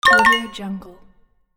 achieved

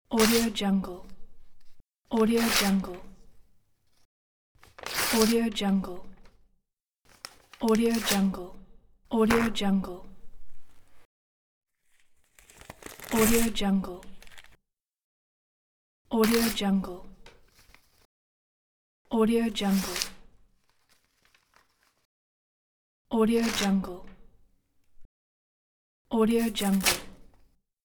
tear off paper

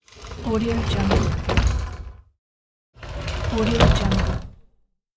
shower sliding door; lokohighman

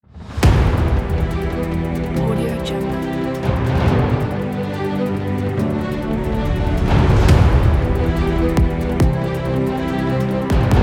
powerful epic

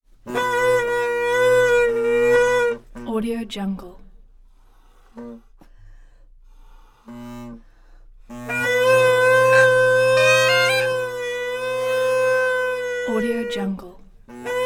bagpipes squealy; prosoundfx

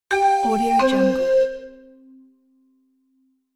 flute marimba video game fail or defeat

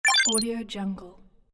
bonus pick up; takeyourcake

